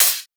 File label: hat; Anthemic